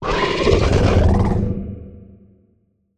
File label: Low Roar Short, Monster Sounds; TheDeclineOfWarriors; Monster Sounds Volume II